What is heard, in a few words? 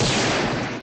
drop target hit; sfx